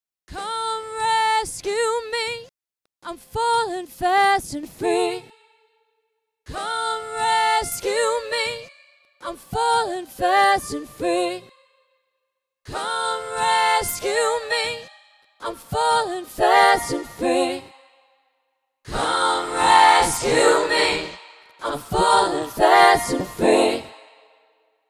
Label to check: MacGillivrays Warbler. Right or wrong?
Wrong